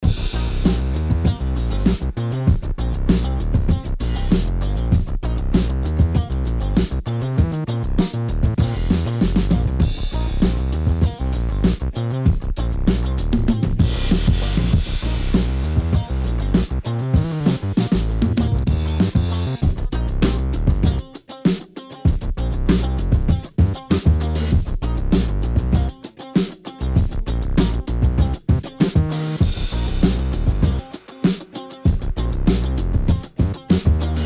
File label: Funky